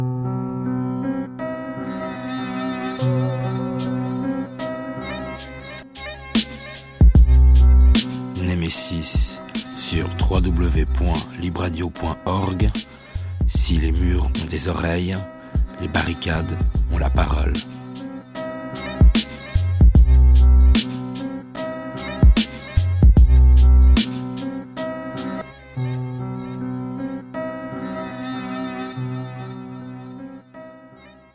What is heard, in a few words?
Jingle